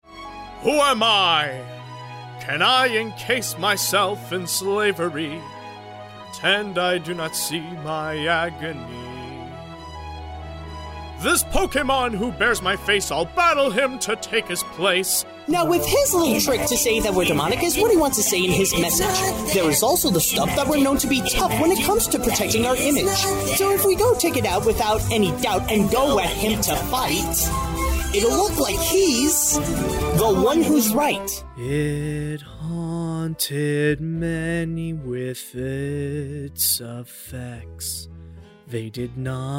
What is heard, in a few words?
Singing; professional male actor from Montreal, Canada